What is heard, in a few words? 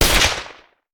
Hand Gun; Sound Ideas; Guns